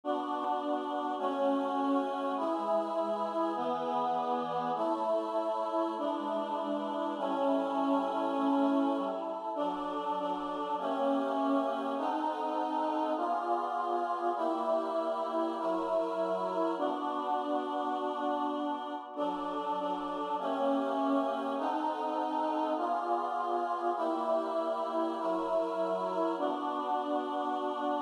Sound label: Hail Holy Queen Ténors